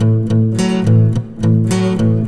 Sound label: Acoustic Guitars; ACID MUSIC; Poe Dunk